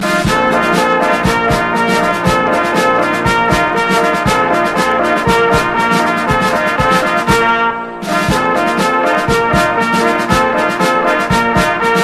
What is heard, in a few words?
GUITAR TRIO; NEW WAVE